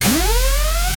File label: quick tech spark sound; video